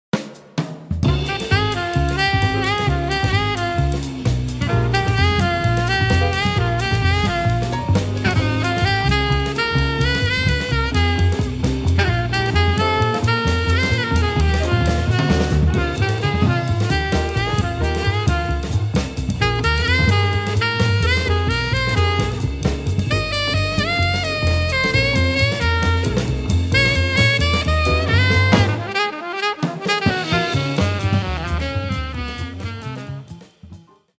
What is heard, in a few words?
Blues